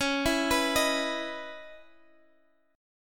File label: Db Minor 9th Db Guitar Standard; arpeggiated; JGuitar